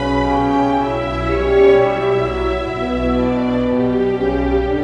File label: PRELUDIUM - General Ensemble